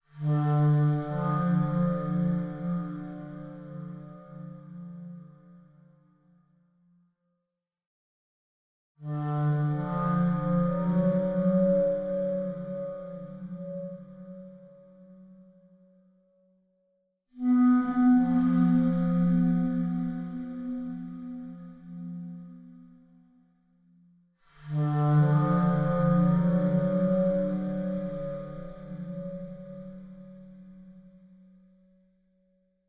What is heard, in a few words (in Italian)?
clarinetto etereo; Music (used and not used in the final version) for the movie Le détour